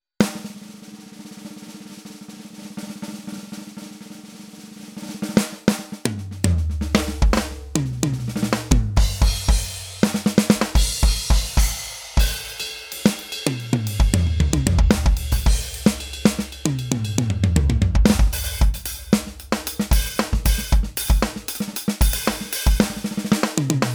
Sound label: radio drum; VaultX